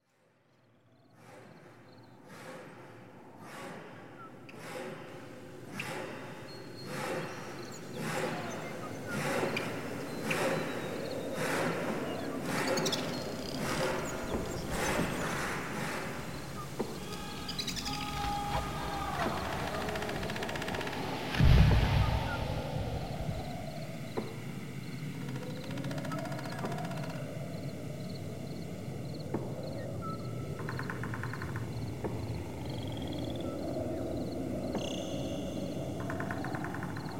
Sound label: Logging Ops steam